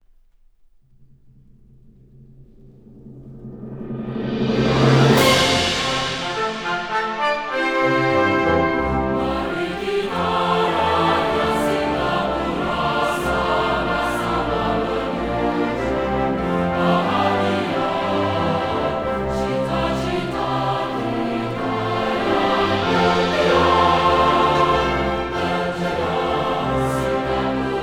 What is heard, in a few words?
full orchestra with choir; majulah singapura; sso 2019; national symbols; anthem